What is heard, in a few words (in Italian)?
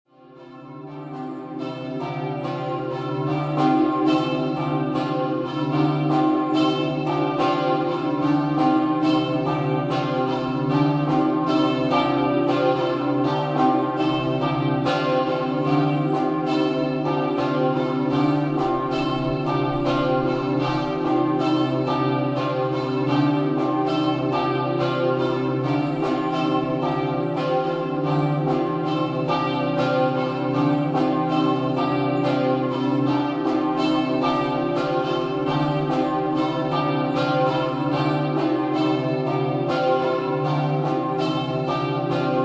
campane; artegna